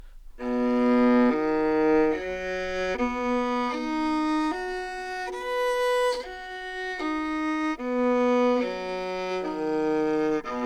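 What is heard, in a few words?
baroque viola arpeggio slur, Anechoic; OPENAIR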